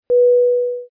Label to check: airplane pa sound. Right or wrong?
Right